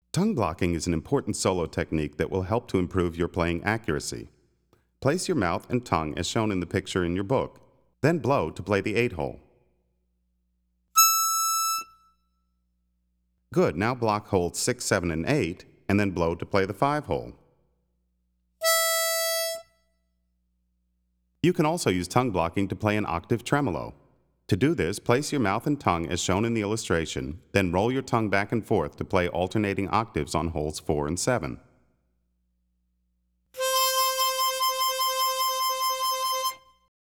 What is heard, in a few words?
Tongue Blocking, harmonica; Play Harmonica